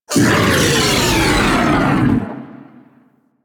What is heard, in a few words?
Vocal Stunned, Enemies; Warframe; Teralyst; Ropalolyst